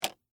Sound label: Game Card Drop, On, Board, Property, Cards, Large; Sports; Monopoly